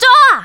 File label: Shout; Faux Hero shouting